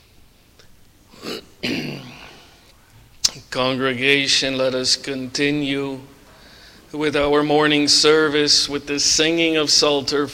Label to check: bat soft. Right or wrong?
Wrong